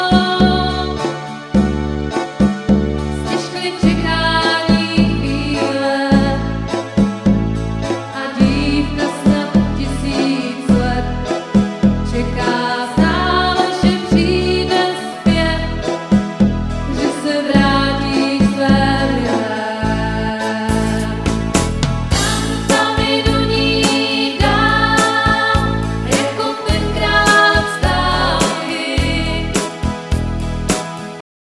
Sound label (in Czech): Tamtamy